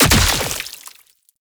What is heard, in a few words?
Dismember Gore Impact; Warframe; Weapons; Bullet Impacts